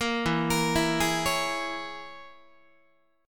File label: E 6th Flat 5th E down Guitar; E6b5 arpeggiated; JGuitar